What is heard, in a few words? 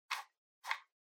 Portable Radio Volume Dial